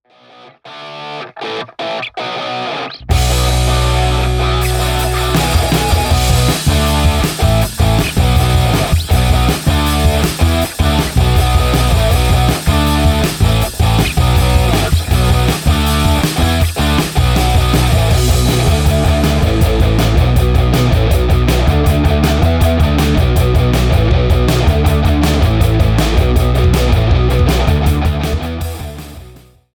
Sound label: Matrix 160bpm